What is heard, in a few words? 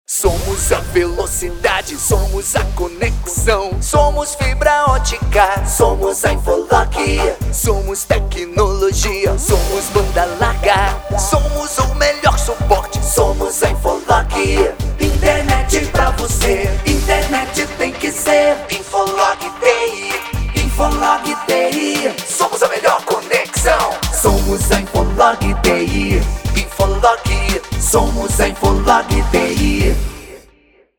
jingle